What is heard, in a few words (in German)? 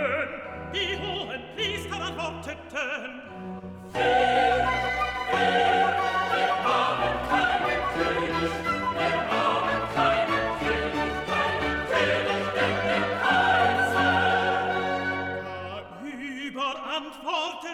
BASS, Stimmen mit Klavier; Johannes Passion